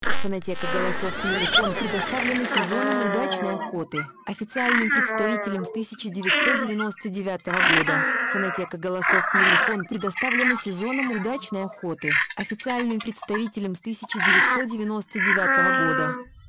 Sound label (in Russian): Нырок красноголовый; plurifon